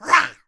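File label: MON ATTACK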